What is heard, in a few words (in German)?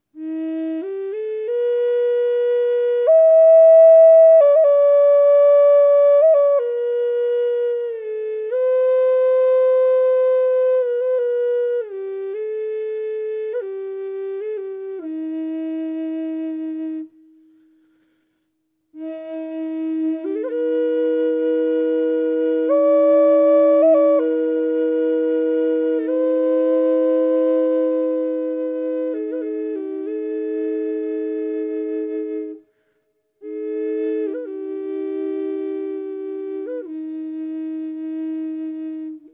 E 432 ohne, drone flute e 432hz zeder